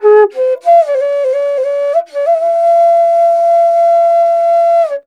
INDIA ALTO

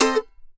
MANDO 7TH F7; Sampleheads; Whole Lotta Country 1 & 2

